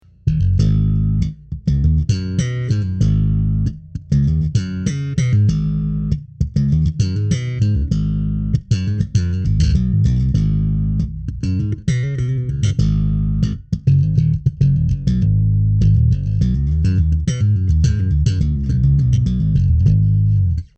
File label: thumb slap